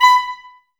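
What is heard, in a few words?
clarinet, Woodwinds; Sample CDs; Miroslav Vitous; Woodwind Brass Ensemble; Banks W&B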